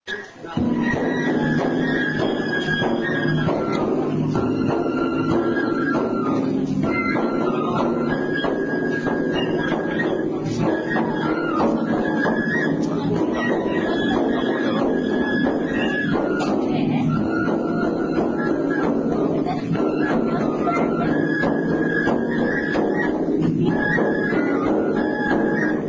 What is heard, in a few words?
oodaiko